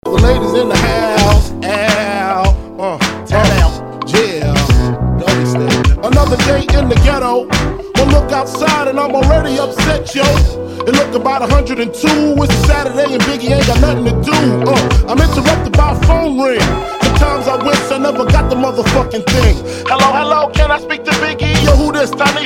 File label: Club Party MashUp